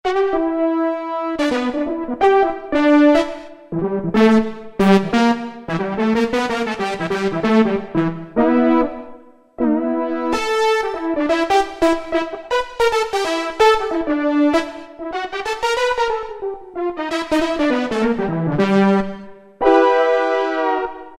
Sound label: BR ZapBras, Yamaha TG77; Factory Presets